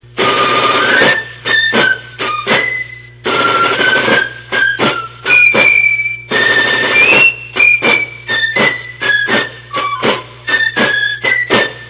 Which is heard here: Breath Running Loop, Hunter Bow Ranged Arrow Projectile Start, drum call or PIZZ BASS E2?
drum call